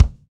BD, KIT Real-Kit; 90 s Sample CDs; Sound & Vision - Gigapack I CD 1 (Roland)

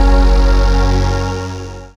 SYN DANCE, Roland; Sound & Vision - Gigapack II

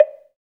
PRC BLOK, AFRICAN PERC; Roland LA

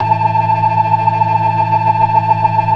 FAST VIB, ORGAN, TREMOLO VIB

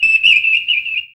FX Dance

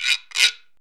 WOODEN GUIRO; PERCUSSION; AMG - Vince Clarke Lucky Bastard

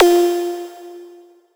lead struck F

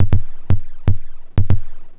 Kick, Trance, Drums

